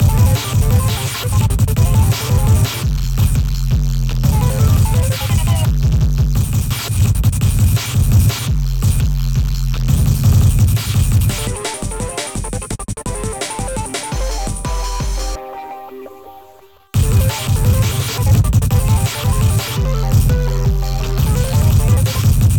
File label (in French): distorsion